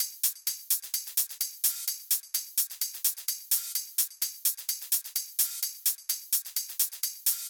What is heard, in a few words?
Loops 128BPM, Drumloops